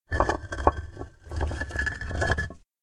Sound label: Deep Rumble Walls